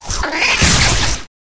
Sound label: Wombat, pets; vombat